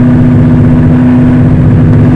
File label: challenger off very low